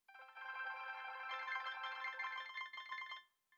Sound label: granular piano Cmaj; bespoke - Floral v.2